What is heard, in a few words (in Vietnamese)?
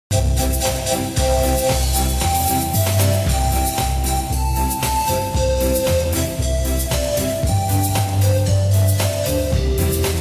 nhạc không lời, beat